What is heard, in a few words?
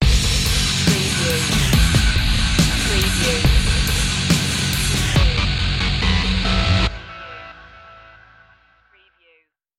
anvil stinger